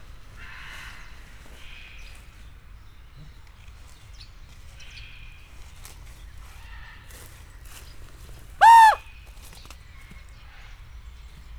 holló; állathangok; nyíregyházi zoo 2010

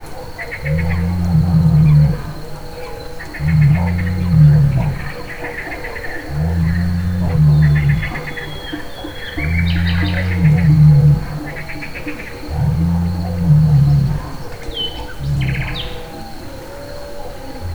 esti táj kecskebéka aláfestéssel, puszta; Hortobágyi Nemzeti Park

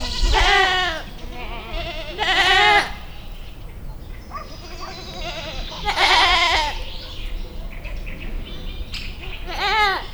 kecskecsalád, házikecske; szegedi zoo 2011

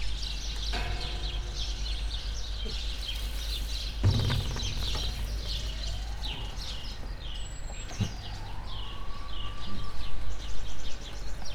halk mordulás, tarajos sül; debreceni zoo 2012

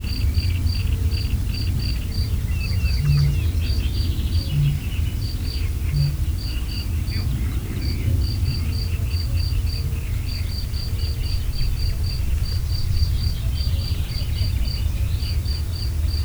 mezei tücsök is, bölömbika; termeszetben

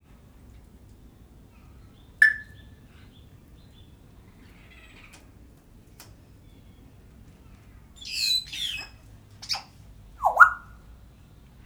jakó csőrícse, jákópapagáj; szegedi zoo 2011

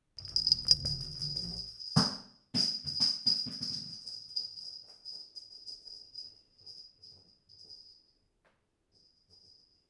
gurítás jobbról földön végzi, csörgőlabda; jatek asztalonguritas2015

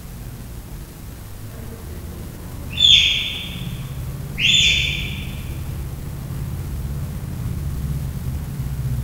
három hónapos kölyök kettőt csipog, leopárd; miskolci zoo 2009

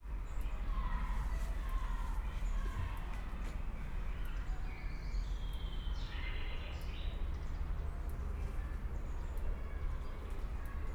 északkínai leopárd; szegedi zoo 2011

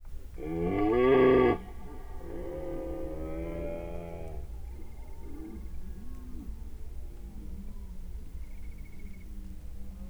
távolból válasz, gímszarvas; termeszetben

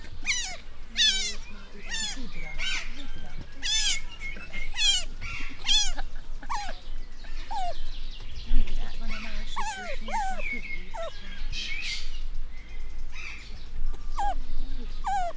lefelé fordított mikrofonok, ázsiai kiskarmú vidra; jaszberenyi zoo 2016

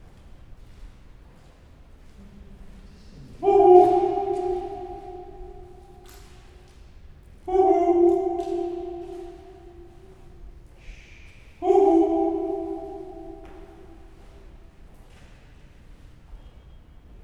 huhu visszhang archeológiai múzeum

